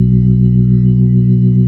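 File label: B-3, Club Chorus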